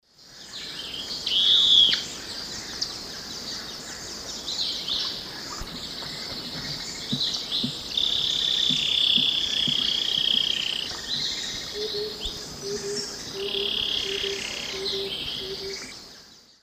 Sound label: Philydor erythropterum, call and song; S. America; Eastern Lowlands